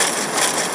PACKING; Greytsounds; INDUSTRIALFX

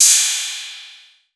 808, CYMBAL; Wizoo - Platinum 24 Electronic

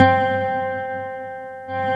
C4, SynthLine

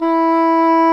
WOODWINDS

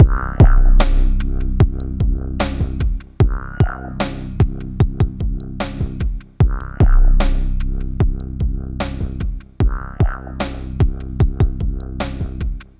Darkwave, Processed Percussion

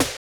DANCE SET, DRUMS

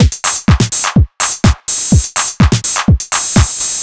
drum loop jacker full 125, Classic Chicago House; Sample Magic; Drum Loops

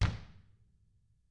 DW FELT, KICK

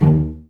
CL PIZZ D1; Best Service; Peter Siedlaczek's Advanced Orchestra

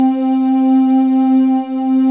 SYN Voice; Best Service Gigapack 1

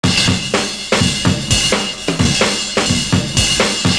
CRASHING, loop

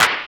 SNR WHIP; AMG; Now CD-ROM (Roland); Snares

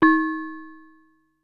Doorbell, Ensoniq SQ-R Plus

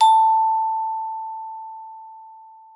GLO A3, GLOCKENSP; Xsample VOL-4

